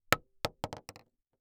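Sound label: Weapon Bullet Shell 16 gauge Drop On Trunk; AdobeCC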